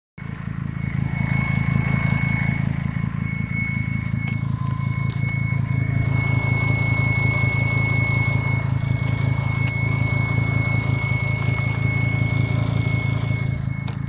squealing whistling noise lower engine bay volvo squealing; volvo s60 v60